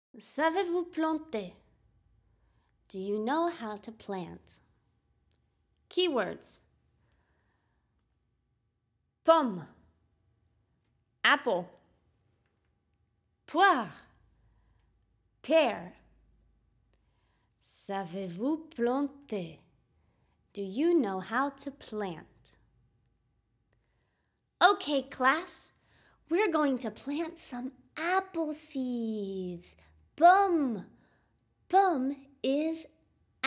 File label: Planting Apples French; Fruits